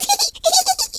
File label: laugh, Southern Belle; speech